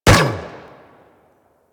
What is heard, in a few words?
Energy Rifle Fire; Warframe; Venus; Venus Spaceman Carrier Agent